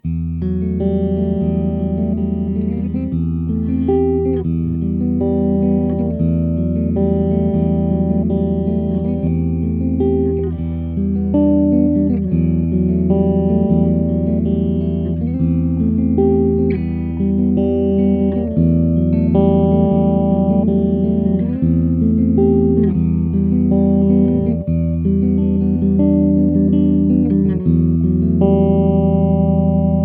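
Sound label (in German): clean beide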